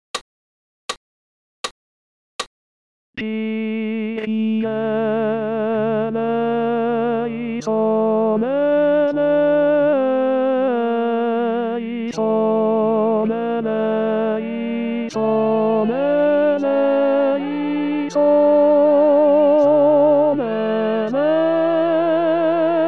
Ténor; Missa Brevis Kyrie; Antonio Lotti

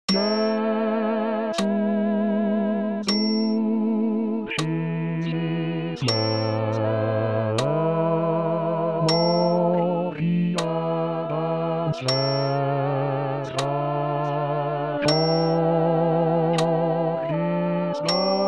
Basse; Jesu dulcis memoria; Tomás Luis de Victoria

